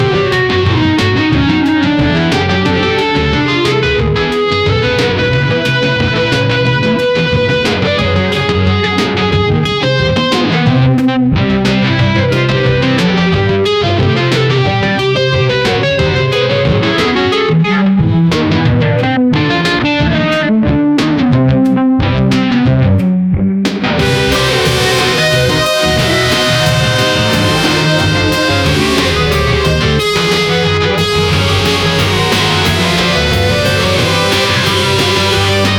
create a slow gentle lull, lullaby

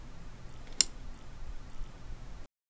the microphone is inserte

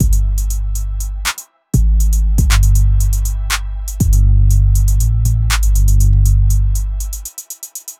hip hop instrumental; trap

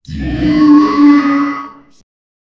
a creepy horror bear muta

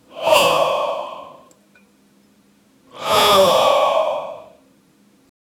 deep strained male grunti, fitness

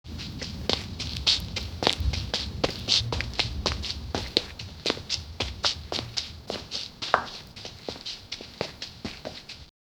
girl running barefoot on; clothing rustle

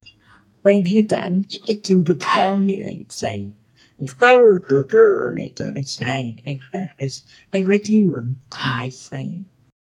a crowd is singing song, bollywood; sound effect

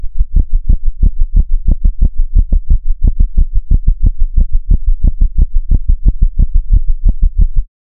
a slow heavy rhythmic low, pulse

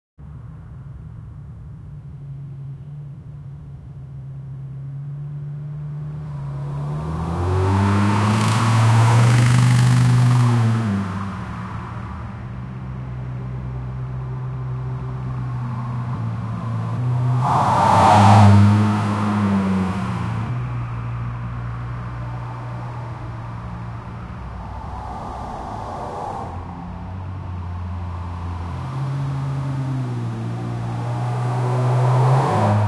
full auto on hk416, automatic fire

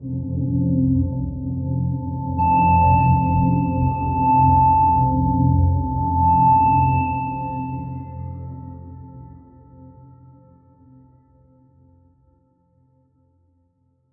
bgm slow dramatic ambient, melodic

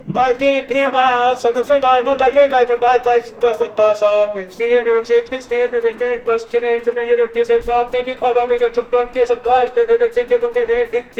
cockpit voice recorder tu, airplane

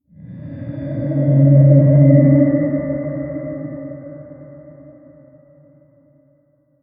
void ethereal growls

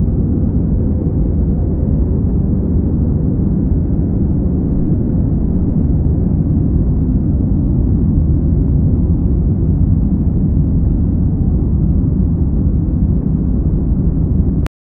bass rumble

